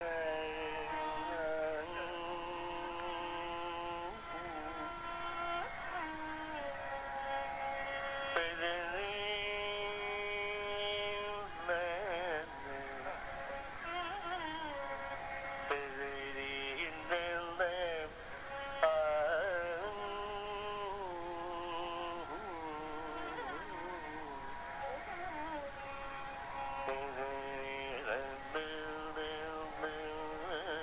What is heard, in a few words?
Carnatic